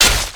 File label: basic attack; masteryi base